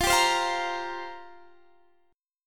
F Suspended 2nd Suspended 4th, down, Guitar, Half Step Down